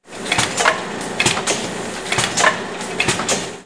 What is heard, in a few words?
binder, industry equipmnt; KABOOM! - Wild and Wacky Sound Software